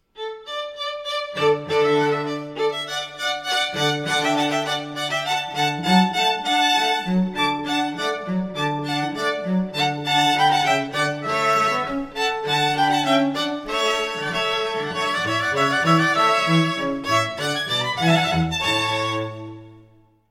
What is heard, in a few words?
Trio La Réjouissance, string ensemble; Just Divine String Ensembles